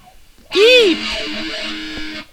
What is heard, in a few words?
eep; valve; head honcho